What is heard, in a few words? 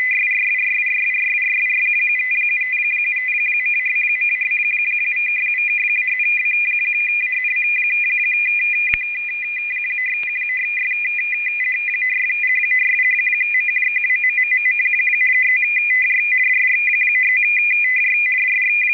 BDT 1STOP inv; BAUDOT 1-stopbit running 50.08 Bd/400Hz with bit inversion; FSK-systems